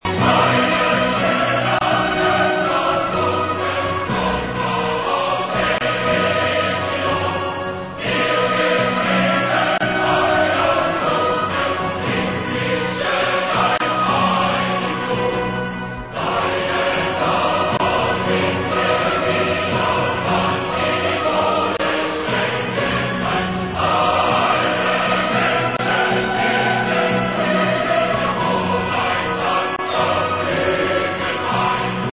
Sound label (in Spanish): himno europa